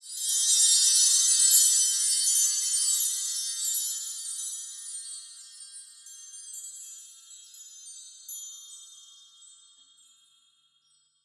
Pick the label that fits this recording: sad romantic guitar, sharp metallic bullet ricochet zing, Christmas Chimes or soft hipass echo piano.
Christmas Chimes